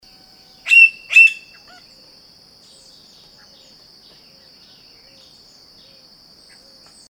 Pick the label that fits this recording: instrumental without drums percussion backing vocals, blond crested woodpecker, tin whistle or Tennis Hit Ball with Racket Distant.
blond crested woodpecker